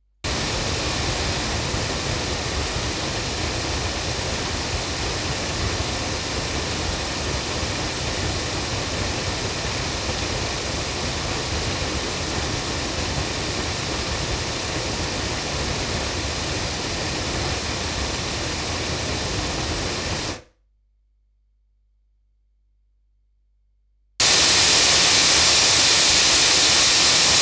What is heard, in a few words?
8 loudspeakers; microphones; studio projects; SP LSD2